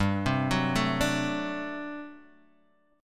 G Major 7th Suspended 2nd Suspended 4th G down Guitar Open D